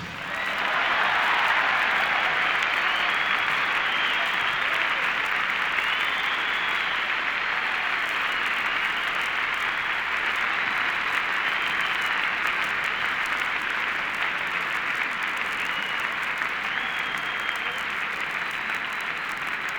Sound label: Applause; Transition Back to Orchestra for Finale